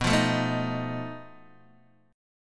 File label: B 7th Flat 5th B Guitar Open D